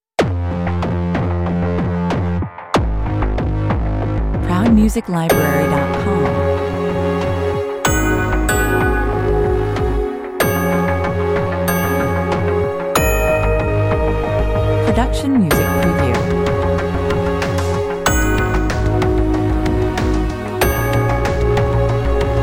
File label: commercial